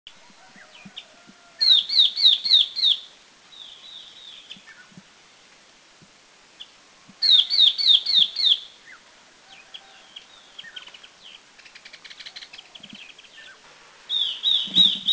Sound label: Yellow-eyed Babbler, Doi Ang Khan, Thailand